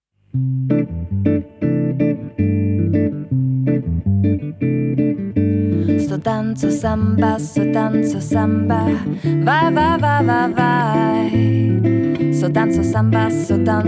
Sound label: Samba